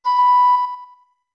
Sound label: Notes Flute B med